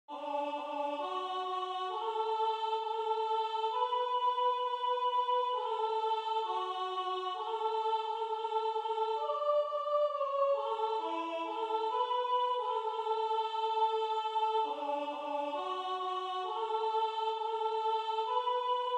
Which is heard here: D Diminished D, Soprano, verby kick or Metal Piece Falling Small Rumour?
Soprano